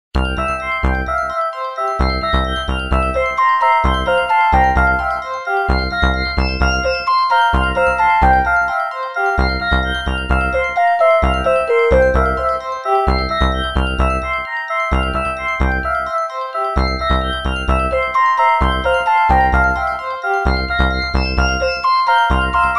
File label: Bubble, Nokia Tones; Windows Phone apps